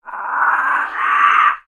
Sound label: Pets Skunk Angry; creatures; Lego